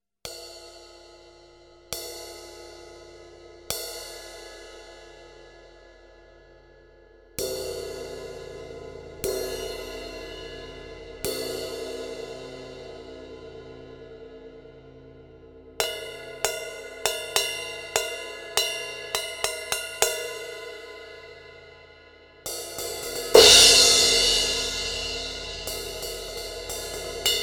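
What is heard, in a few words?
crash SOUNDCASTER MEDIUM 17, CYMBALES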